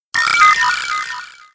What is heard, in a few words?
launch; SSV-Music